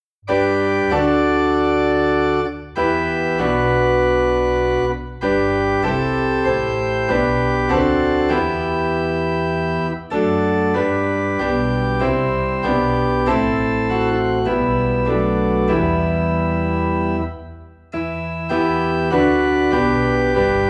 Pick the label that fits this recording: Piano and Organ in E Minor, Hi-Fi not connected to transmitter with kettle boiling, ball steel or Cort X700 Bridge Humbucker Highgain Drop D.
Piano and Organ in E Minor